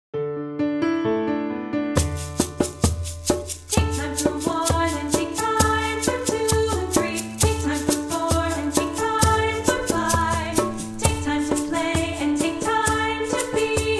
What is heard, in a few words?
Vocal Parts Separated; Learning Track